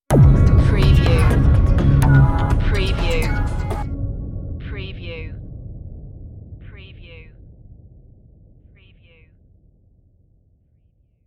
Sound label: dancefloor stinger